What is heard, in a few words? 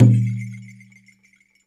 IYA ENU, World Percussion